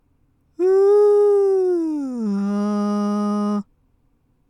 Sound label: glissando mix